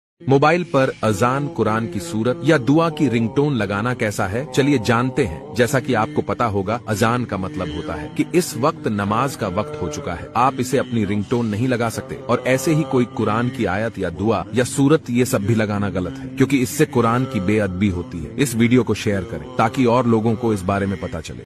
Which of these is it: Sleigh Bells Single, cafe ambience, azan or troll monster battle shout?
azan